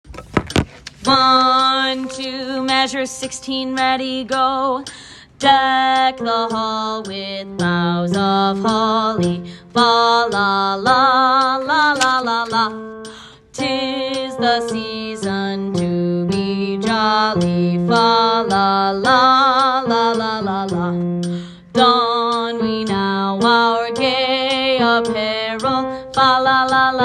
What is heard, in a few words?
bass